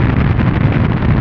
Train; Wanted

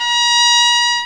Horn; Patton Strikes Back

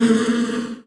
drone; kult

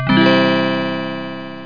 Win game; Jotto ][

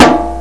punch; Destruction! 2.0.1

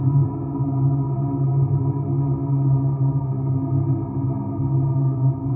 inv tun low; Thief II

